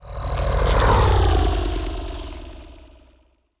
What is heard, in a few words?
ant ship weaver move; Stellaris; biogenesis; ships